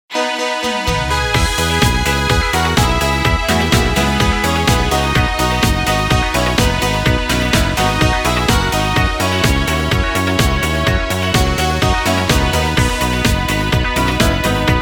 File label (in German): Karaoke